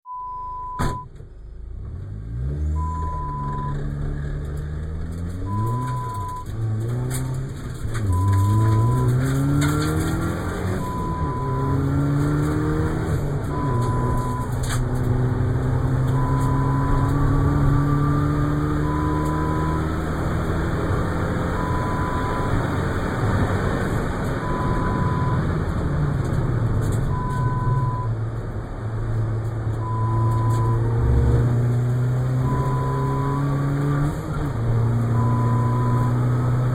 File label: Car Inside